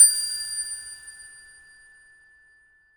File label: Indian Bell Hit Loop; Electronic Music; Ethnic Instruments; Sonic Foundry (Sony Creative Software); The Electronic Music Manuscript Collection